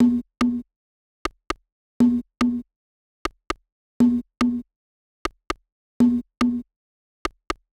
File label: Perc Loop 120; Equipped Music; Loungin House; Perc Loops 120